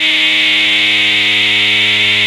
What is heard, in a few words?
rough G#2; Best Service Alpha Dance vol.2